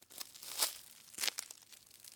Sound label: Climb